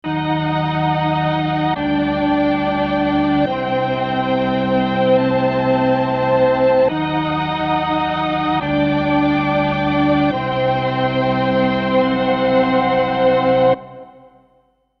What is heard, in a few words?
3 Violins